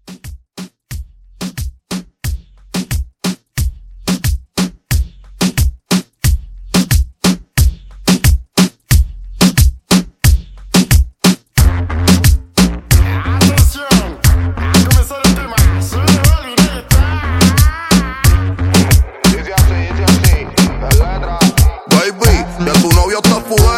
Latin Reggaeton Re Drum 90

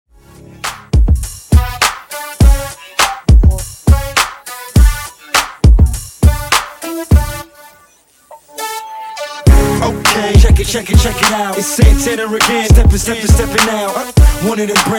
Extended 102bpm

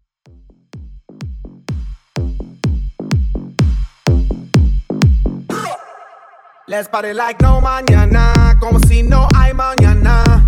Mixshow, 126Bpm; Bootleg

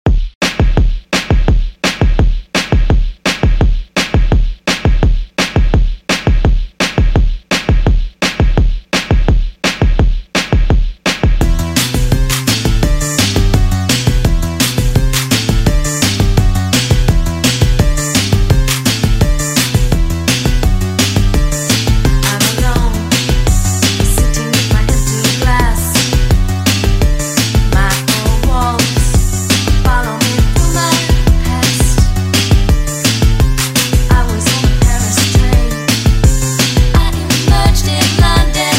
80s Redrum 169 Bpm; 80s Grid Redrum

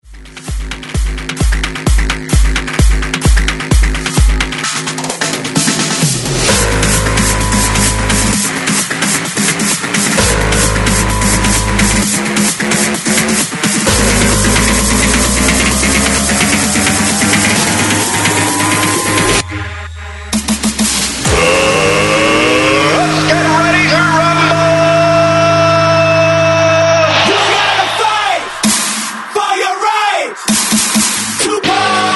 130Bpm BREAKMIX; DJDOLLS

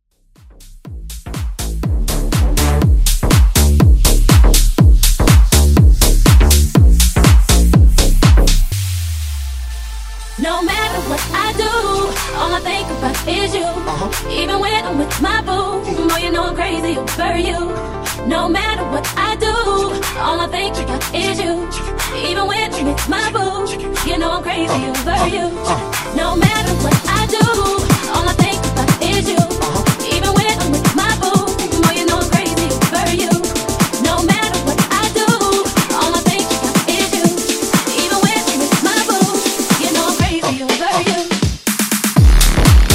House Vocal

